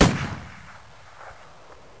RIFLE; CD Action

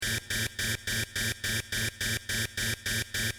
Alarm; the project

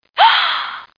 Ahhha; Valentina e la altre di Guido Crepax

